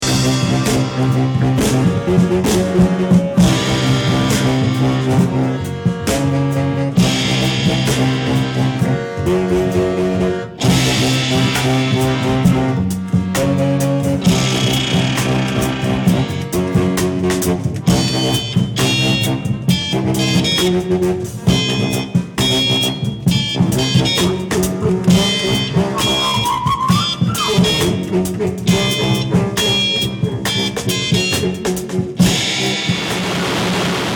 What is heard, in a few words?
Dubstep